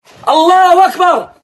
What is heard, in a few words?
charge, Voices Emotes